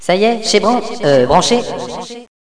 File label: kit; Villagina; Village; ECOLE